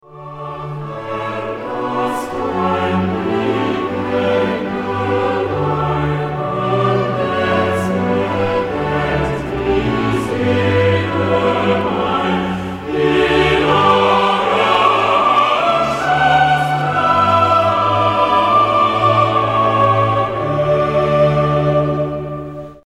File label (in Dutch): slotkoor johannes passion